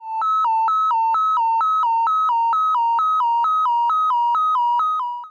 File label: tremolo triton